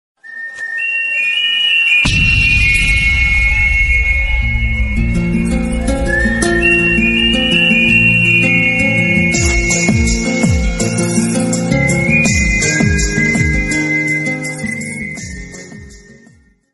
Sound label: popular ringtone call ringtone famous ringtone bengali song ringtone instrument ringtone